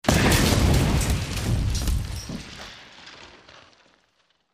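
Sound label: Explosion Glass Debris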